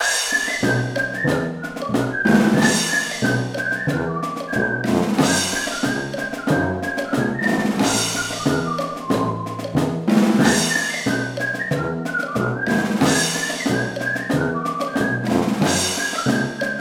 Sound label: marching band